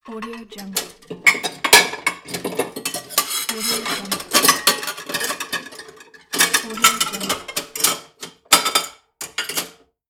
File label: kitchenware; fx-library